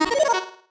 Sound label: marimba dice; ゆめ2っき